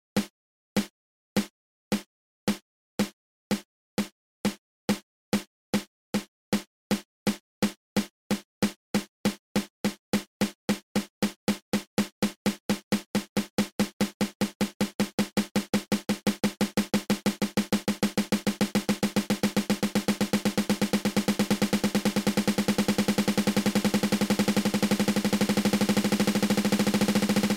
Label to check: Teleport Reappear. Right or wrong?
Wrong